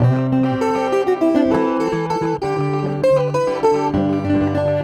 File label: Ac 12 Str Gtr 099bpm C, Twelve String Guitar, African Guitars; Quazibeat; African Secret